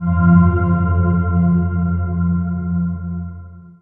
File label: sting box close; monument valley